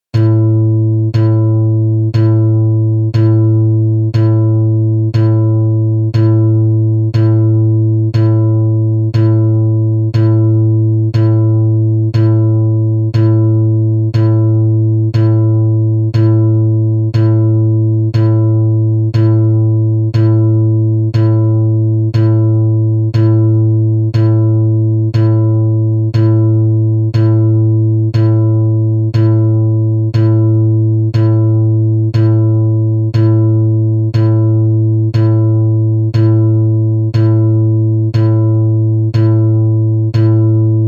A2 Ukulele Bass

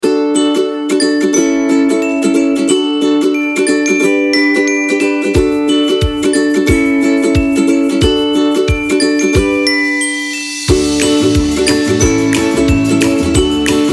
ukulele happy positive

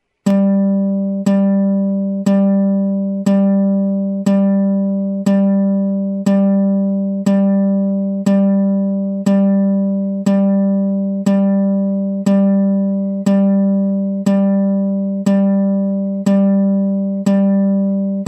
G3 Ukulele

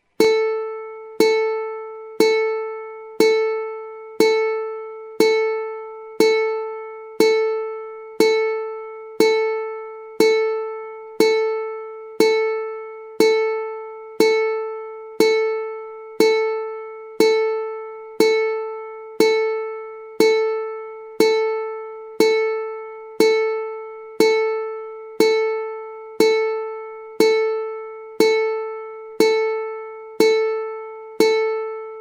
A4 Ukulele

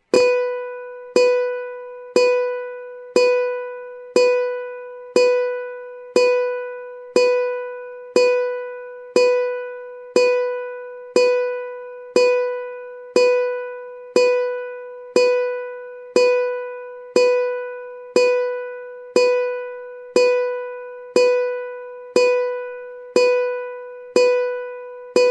B4 Ukulele

